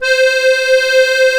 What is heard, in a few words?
DIATONISC, AKKORDEON; Best Service; Alpine Volksmusik